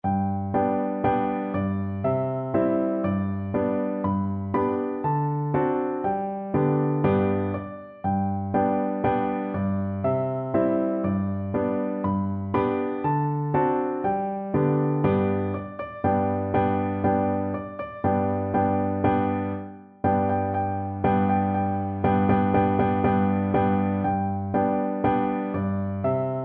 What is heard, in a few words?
old macdonald, piano duet